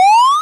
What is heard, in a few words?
powerup